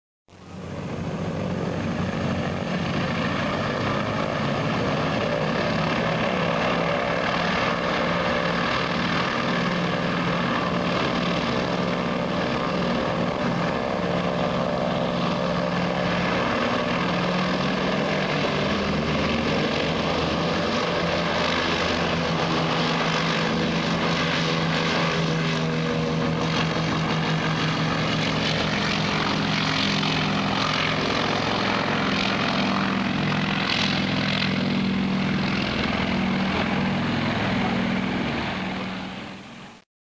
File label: S-2 Takeoff